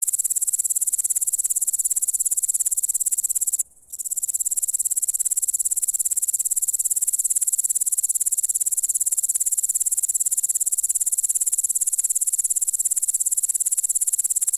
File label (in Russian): кузнечик - звуки насекомых